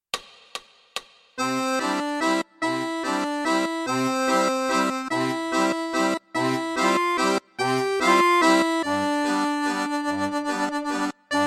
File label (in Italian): Piccolo Valzer; 3° Capitolo - Mani Unite/Accordi Magg.